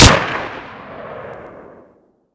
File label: fire kriss; Modern Warfare